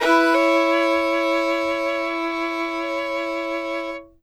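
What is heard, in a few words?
fiddle hammer A4; Sampleheads; Whole Lotta Country 1 & 2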